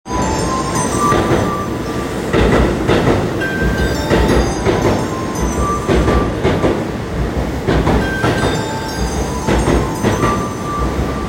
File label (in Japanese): JR宇都宮線東京北接近, train sounds